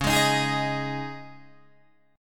C sharp Suspended 2nd, C sharp, Guitar, DADGAD

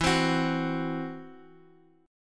E Suspended 2nd Sharp 5th, Guitar, Open F; Esus2#5 strummed; x,11,12,13,x,x

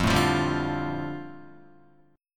F 6th Add 9th F down Guitar, chord

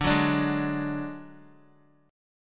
D Major Flat 5th D Guitar, chord; strummed; JGuitar

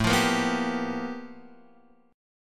G 7th Sharp 9th A chord; G7#9

